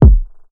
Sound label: high quality fat kick drum sample F key